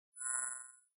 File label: Beep Delay Read Out; beeps